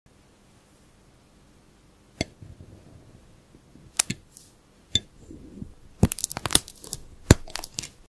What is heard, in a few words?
cake cutting